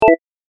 決定

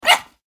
イヌ, 鳴き声

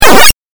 8bit ダメージ

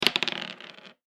モノラル 大きい ダブル ダイス

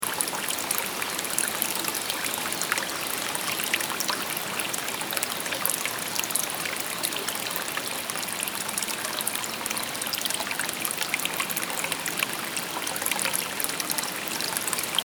せせらぎ音ループ

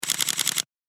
カメラシャッター

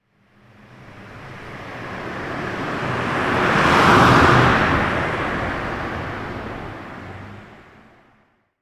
車通行音 長

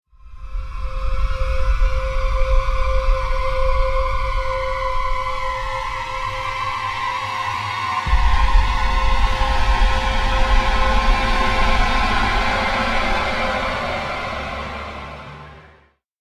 ストリングス クラスター 長い

